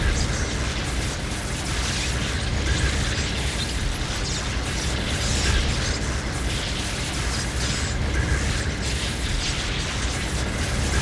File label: sloop; Cosmic Rage; moon